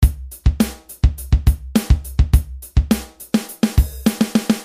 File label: drumloop